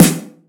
sizzle snare drum sample e key

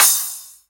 urban crash single shot C sharp key

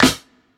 bright snare c key

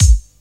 natural reggae kick single shot a sharp key; kick drum samples

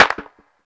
clap single shot b key; single hits one shots

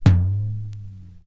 Mid Tom Drum F# Key, Loudest frequency: 209Hz; tom drum samples; one shots

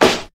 boomy acoustic snare sample f sharp key

